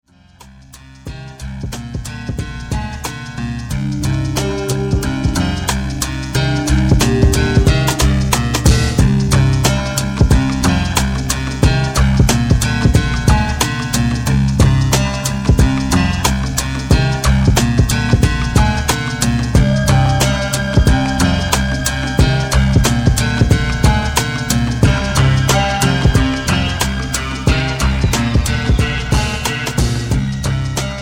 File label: slow rock, prog perc